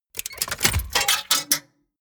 mechanics small movement

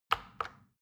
plastic button tiny click regular slow

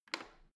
washing machine snap button press out

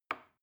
plastic button small push soft

